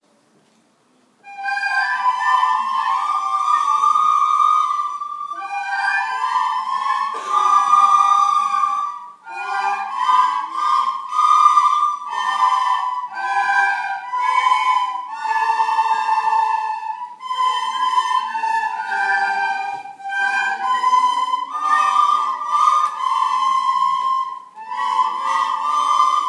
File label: concert yr3 recorder